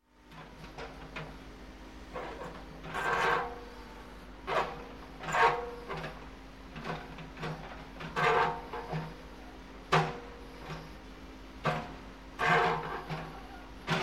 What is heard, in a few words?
Construction, Backhoe Banging And Scraping; Sound Ideas 2000 Series